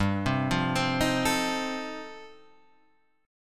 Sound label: F 7th G, F7/G chord; F7/G arpeggiated